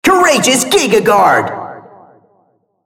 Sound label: spell cast effort; RumbleSkin03